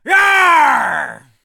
charge, Emotes; Voices

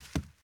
carpet, Footsteps

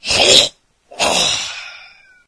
death gasp

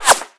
Bullet Whip; Weapons; Guns

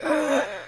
female death gasp

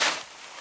sand step, Footsteps; civ13; civ14

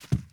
carpet, Footsteps

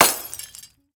glass break

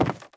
wood, footsteps; civ13; civ14

